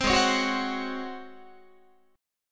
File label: Ab 11th Ab Guitar; 15,16,16,16,16,17; Ab11 strummed